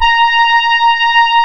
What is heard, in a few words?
A#, Synclav FM, BRASS; Big Fish Audio